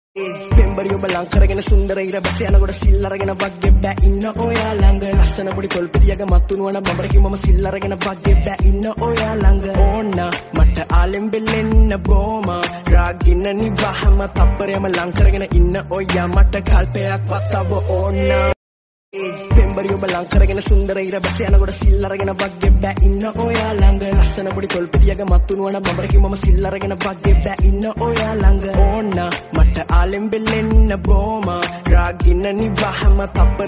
rap Bgm remix

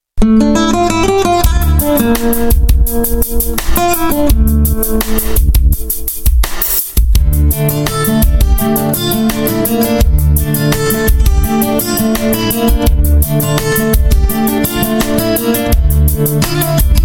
Guitar

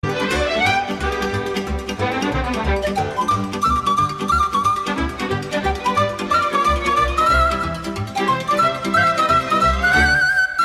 Instrumental Hora

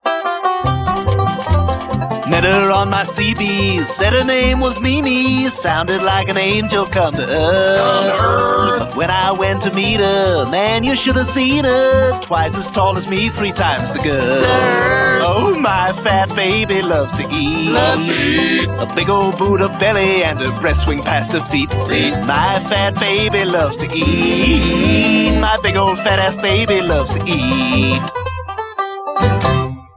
Banjo Song

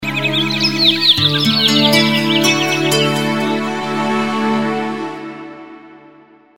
Music SMS Tone

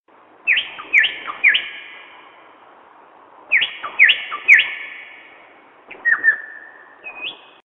Nightingale Sms

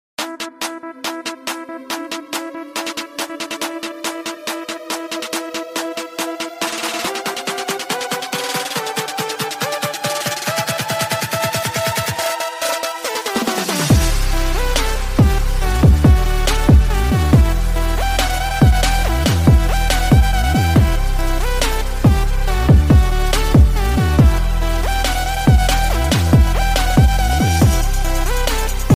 edm

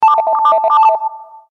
best notification

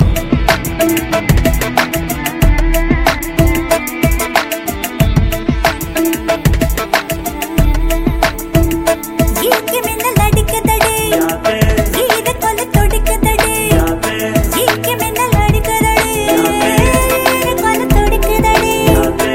Beat

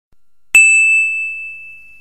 Ting Iphone

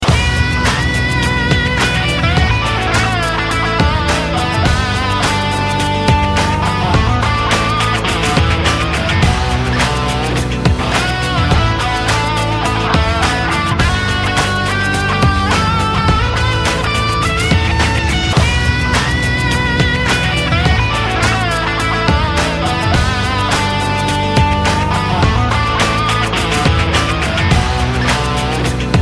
guitar electric rock

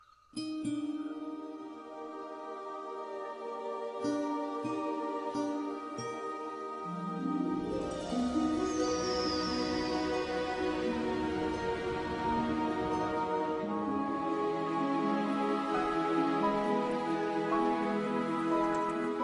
Piano Theme; Guzarish

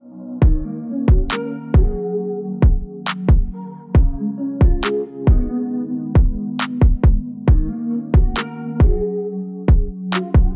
Instrumen

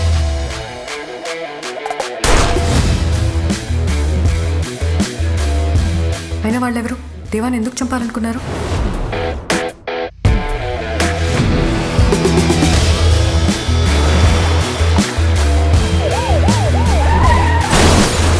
bgm; ranarangam movie 2019

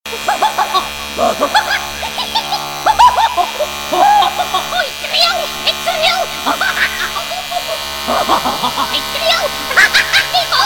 brrrr ik trillll

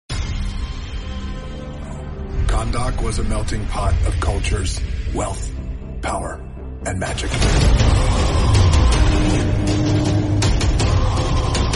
Bgm; Black Adam; Hollywood English

